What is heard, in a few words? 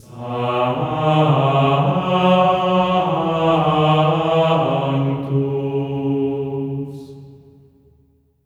SANCTU B3; Spectrasonics; Symphony of Voices; GR SANCTU